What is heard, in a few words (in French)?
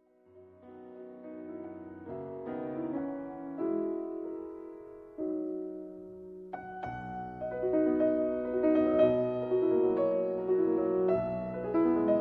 cloches; liszt